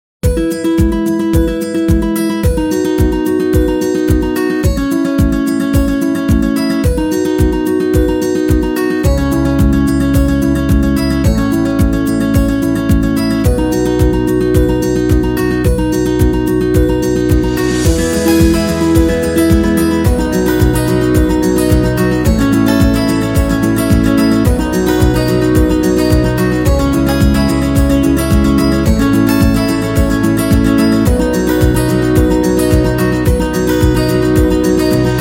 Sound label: Emotional Background, wedding music